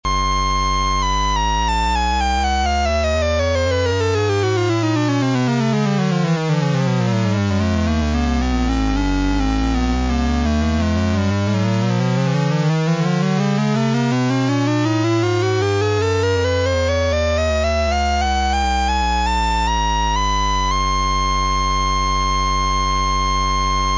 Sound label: dual quantizer; PSIM-1